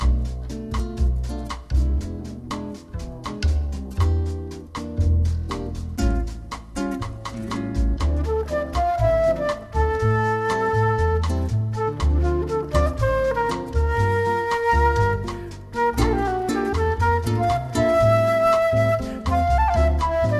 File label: Jingle